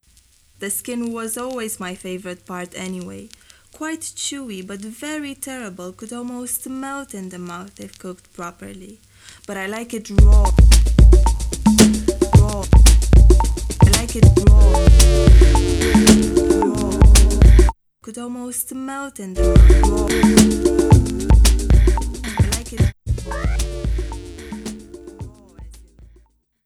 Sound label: raw beat; Unfinished